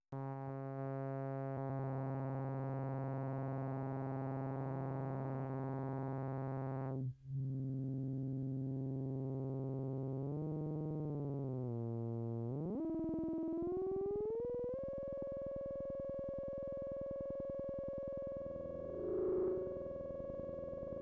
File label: Noise Jam